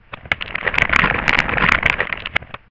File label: piano Earth